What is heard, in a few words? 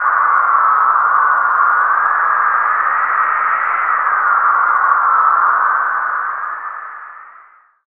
drone, Alien & Drones (atmo); ambient; Chillout